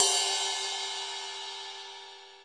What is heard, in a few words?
RIDE, DRUMS SINGLE HITS